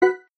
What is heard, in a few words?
item in sound; Caravan Beast